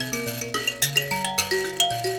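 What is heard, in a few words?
grand mbira phrase, African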